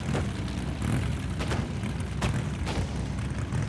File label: hedgehog engine; battle